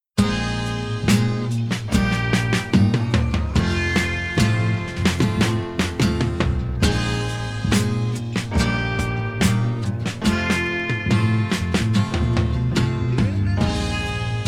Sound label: So Weary Piano